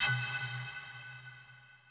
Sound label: bottle, ethno, 125